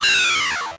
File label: Title Transition Digital